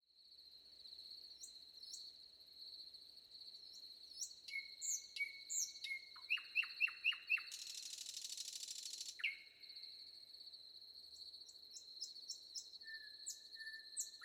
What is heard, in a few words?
nightingale wind crickets